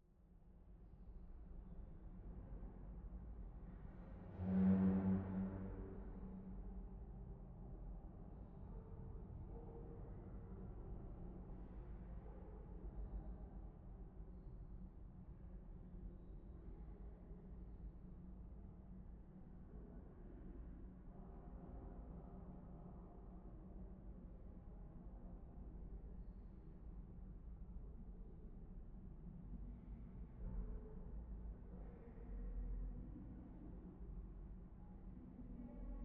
Monster Ambience, Distant Destruction, Huge Groans; 344 Audio; Haunting Ambiences Vol. 2